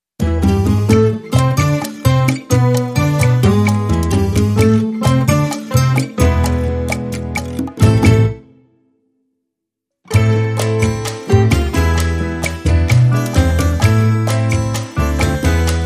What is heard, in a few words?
Cha Cha, No Solos No Synth FX